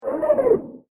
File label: Underwater Scream; Screaming Sound Effects; horror sound effect; Andrew Fleming